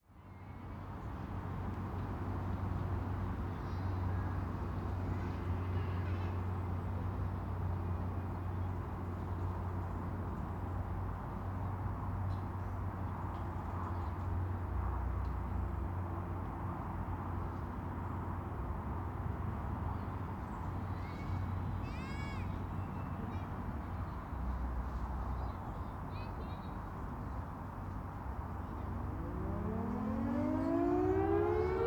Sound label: Siren; Langley